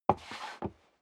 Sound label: step sneaker wood creak scuff; schritte